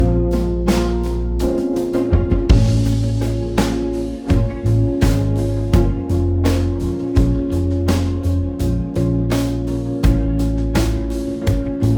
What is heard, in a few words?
loop guitar solo bossa nova C minor bpm 100